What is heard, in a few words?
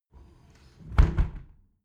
window close tilt regular sequence